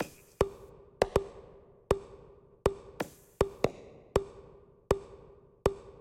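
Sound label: music main game percussion; reef raider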